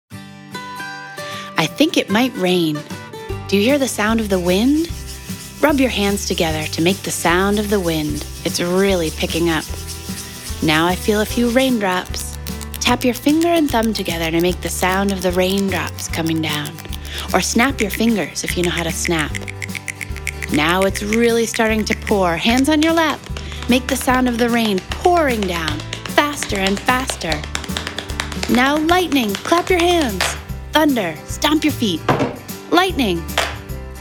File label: rainstorm; mindful moment